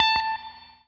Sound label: ROCK, Guitar